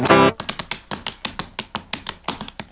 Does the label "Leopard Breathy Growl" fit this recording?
No